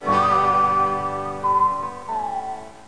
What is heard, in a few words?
Ring Out; Pulp Fiction